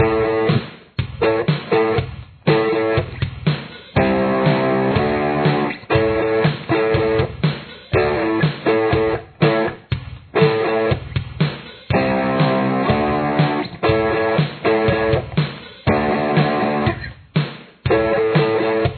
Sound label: verse guitar